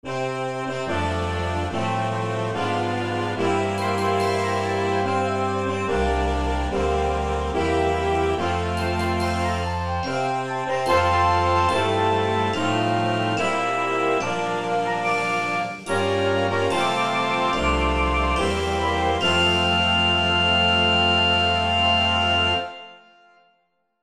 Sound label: Happy Birthday, Concert Band